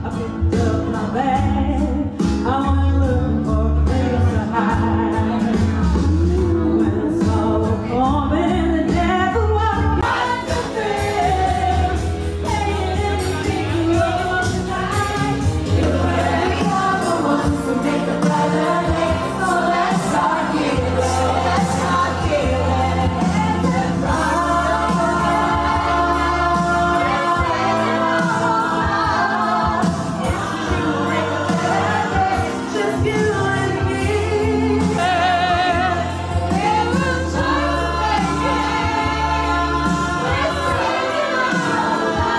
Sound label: KARAOKE